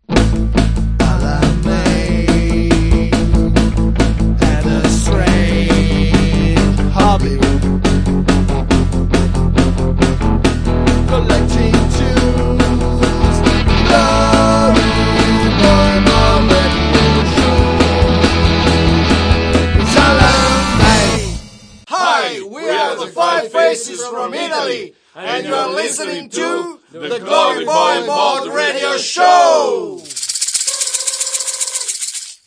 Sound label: Mod Radio Show Jingle; Modernariato